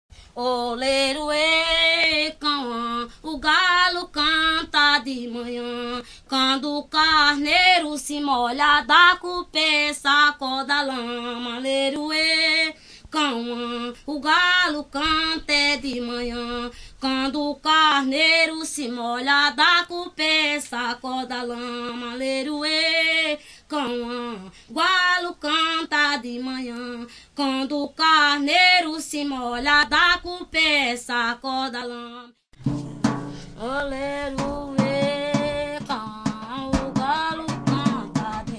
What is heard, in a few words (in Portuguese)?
cocos